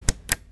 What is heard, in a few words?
tap